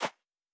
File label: Footstep Sand Running